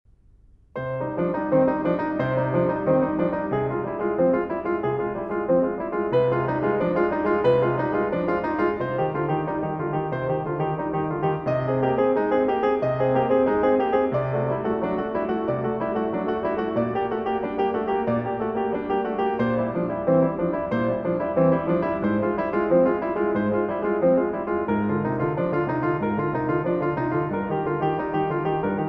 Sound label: Bach Prelude in C minor